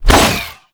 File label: Star Whoosh, Infested Throwing Stars; Warframe; Weapons; Coda Pox